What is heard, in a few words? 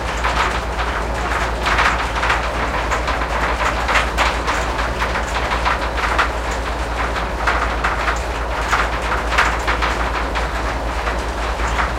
wheels speed 66, engines tanks; War Thunder